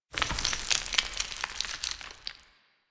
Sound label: Tower Slate Slide; Warframe; Quests; TheNewWar; Ambience; Cetus; Unum Tower